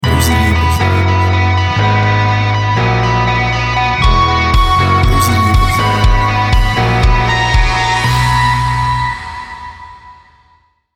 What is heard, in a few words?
uplifted jingle